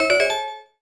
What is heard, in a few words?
Reward Obtained; Pokémon Mystery Dungeon Gates to Infinity